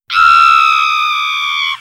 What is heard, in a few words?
Scream